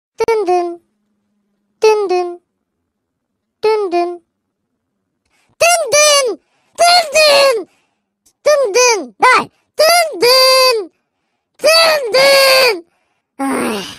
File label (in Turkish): dın dın